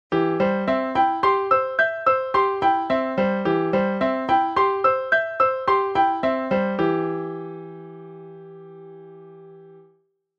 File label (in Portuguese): arpejo